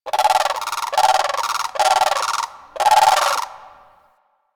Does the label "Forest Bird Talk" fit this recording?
Yes